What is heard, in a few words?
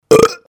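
sound of burp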